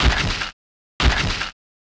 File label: Head Walk